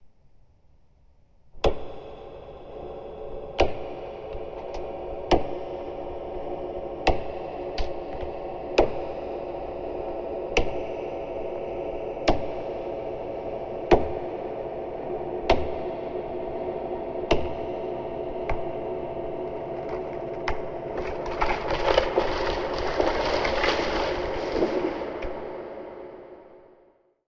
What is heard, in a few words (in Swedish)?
skogs